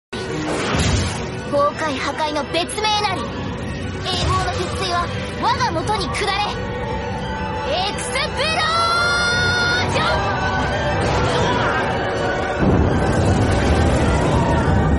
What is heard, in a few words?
explosion; megumins